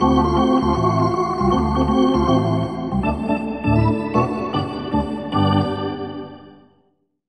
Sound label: Hockey Organ